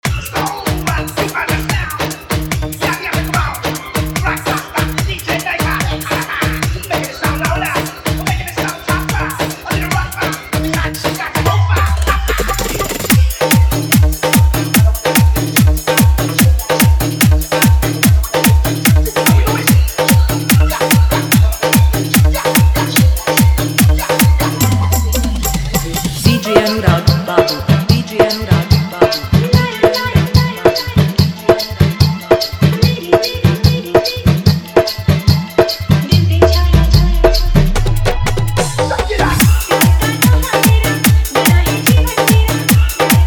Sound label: Hard Dhollki Dance Mix